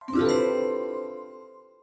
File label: egg spawn